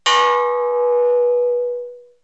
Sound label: Clang